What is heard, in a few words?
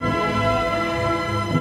Beethoven chord